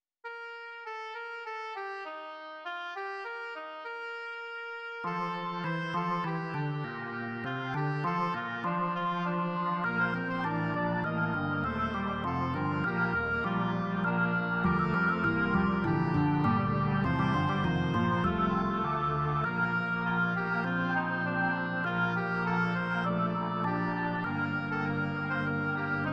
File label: fugue